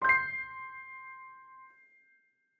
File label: intro Free Spin; jingle spin